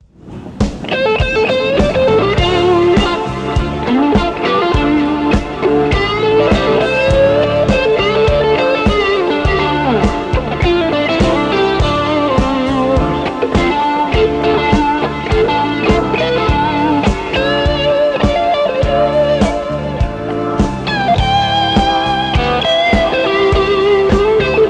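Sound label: solo, guitar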